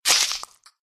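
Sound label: undead boss attack contact up; umertvie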